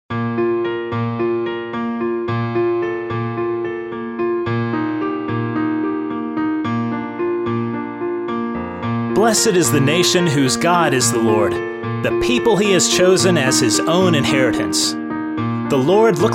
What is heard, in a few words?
Underscore Vocal